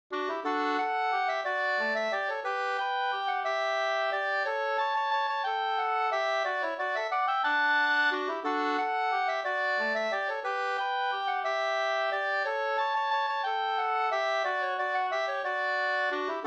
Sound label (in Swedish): vals; Fiolbyggarens vals